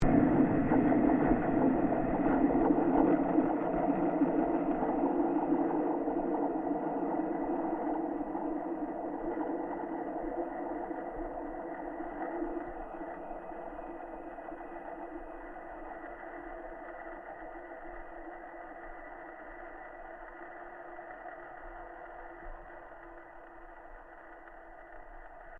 Tram Movement Vibrations Through Rails, Tram vibrations via contact mic on rails; Streetcars